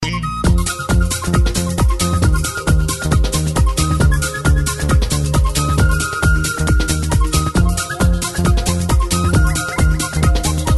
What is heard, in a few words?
Keyboard